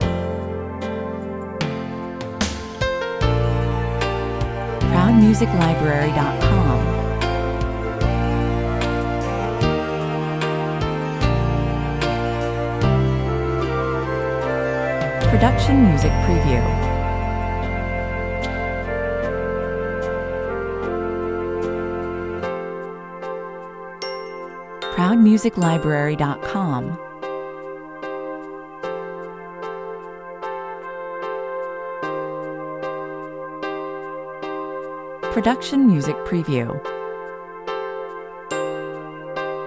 open book 75 bpm, A tender, soft mid-tempo ballad soundtrack with a calm and steady pulse; well suited for scenes depicting romance, friendship